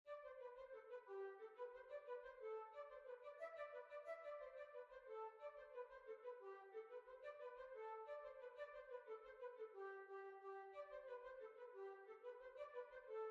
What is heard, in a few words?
Hornpipe; Miss Menagers Hornpipe